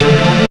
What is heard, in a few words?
DIRT HIT, STAB; SoundFactory; SMPMANIA